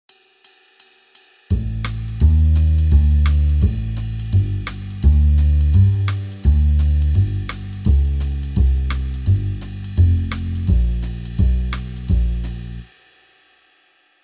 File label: Jazz backing, Guitar; Guitar Techniques 2017 Issue 272 August